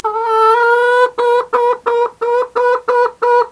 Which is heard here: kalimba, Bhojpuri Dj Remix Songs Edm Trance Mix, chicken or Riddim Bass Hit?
chicken